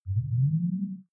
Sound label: game start deep, signale